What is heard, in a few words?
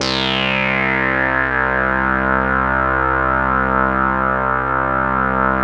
ODY bassics C2, vintage keyboards; Big Fish Audio - First Call Vintage Keyboards